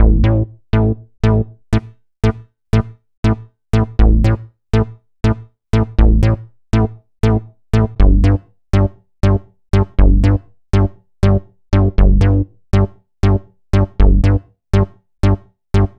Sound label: Bass, EDM; Sonic Foundry (Sony Creative Software); Afterhours EDM; Retro Active